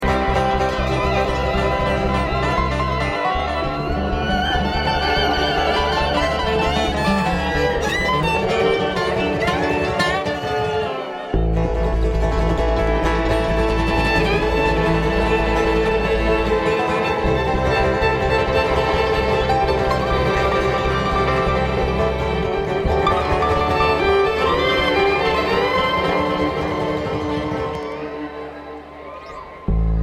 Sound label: Traffic Jam